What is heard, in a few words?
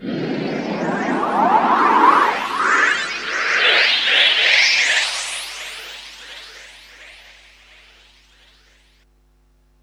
rise, fx & sweeps; EZRollers